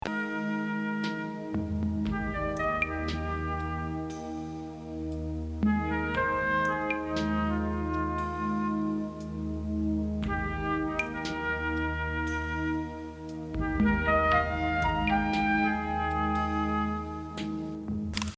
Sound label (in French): musique pour patienter